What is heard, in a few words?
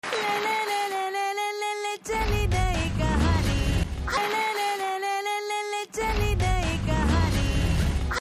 Trailer BGM Ringtone; Shakuntala Devi